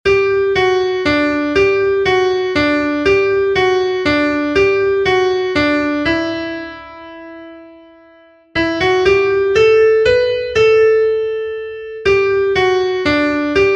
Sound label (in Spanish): SOPRANO, himnos en piano; cantos del camino